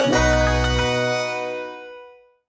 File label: symbol common; Wild Guns